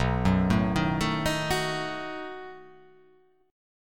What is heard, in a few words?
B 7th B down